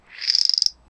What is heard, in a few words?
anthropomorphic red eared, reptiles